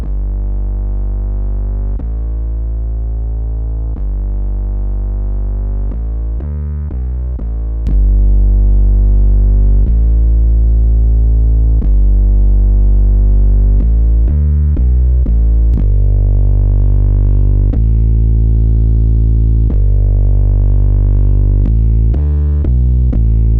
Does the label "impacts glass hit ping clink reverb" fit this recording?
No